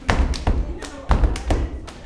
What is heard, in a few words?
SLAP STOMP; Blues & Soul; HOW IT IS